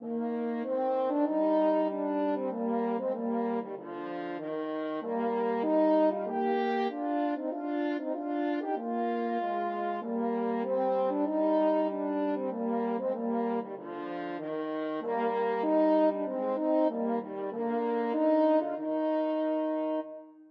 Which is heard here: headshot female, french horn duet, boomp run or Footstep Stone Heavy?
french horn duet